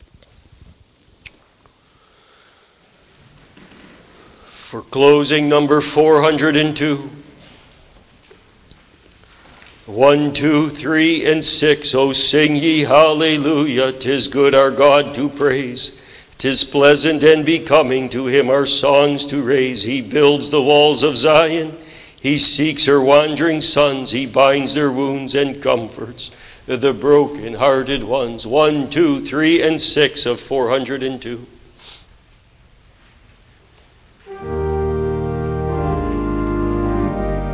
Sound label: Singing; The Lord's Crowning Goodness; psalm 65 11